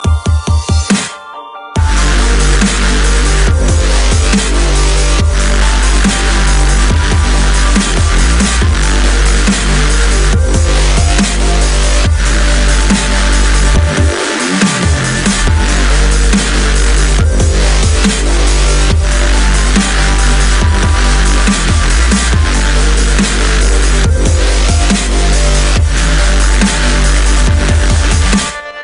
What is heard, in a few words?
Dubstep; meloboom